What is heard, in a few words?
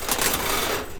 chain